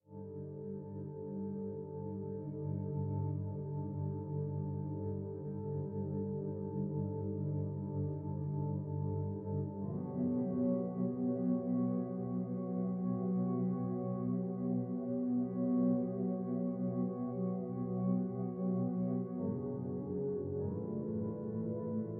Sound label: bgm 100bpm C major minor; 96beats; chicory a colorful tale; ps4